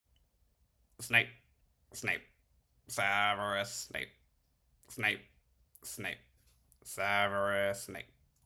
metronome

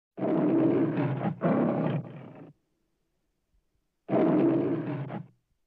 classic roar; mgm

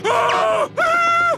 screaming; sun bleached

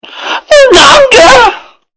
nokia version; dankae

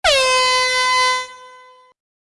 air horn

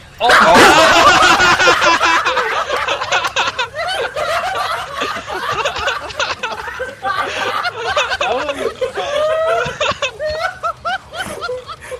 cackle; dynamo

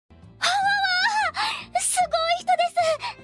hawawa; lyria; singing

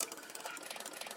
claw moving

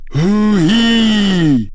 hoo hee

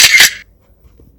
foto android 4 1

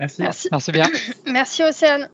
hum

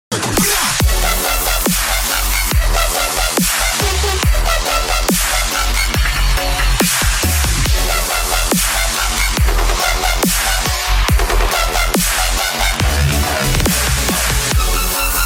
dance off

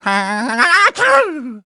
sneezing aaa

